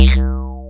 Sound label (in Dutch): Roestige schop, FM; Polyend